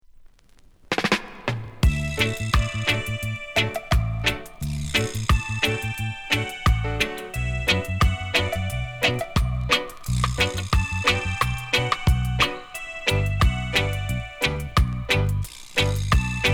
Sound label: REGGAE